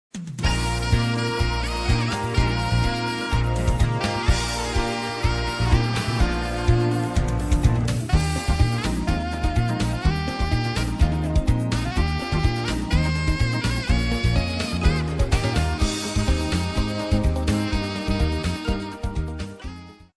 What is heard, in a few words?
jazz pop